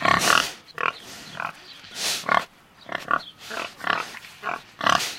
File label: wild pig